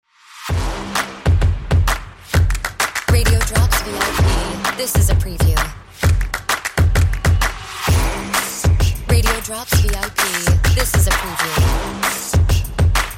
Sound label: Music Bed